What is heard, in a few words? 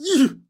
histeric short; donnie; Blind Drive